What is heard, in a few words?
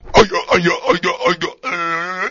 commit suicide, weapon; wormux